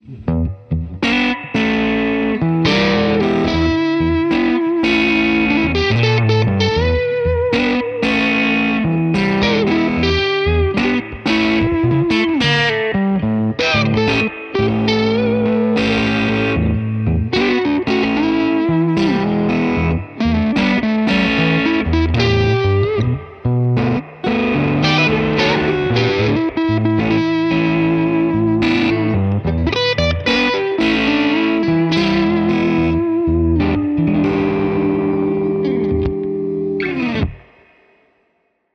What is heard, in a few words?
blues strat lextac; poulin